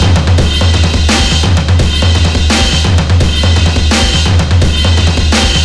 drums

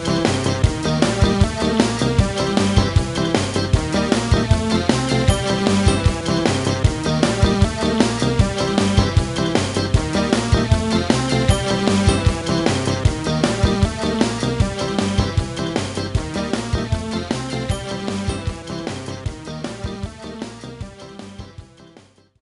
Music Bumper Cars; six flags fun park 2008 nds gamerip

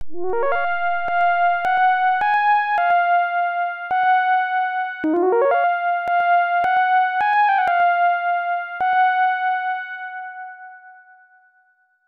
The Fairy Flute; Valna; PC-8801

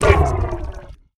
Monster Death; A Robot Named Fight

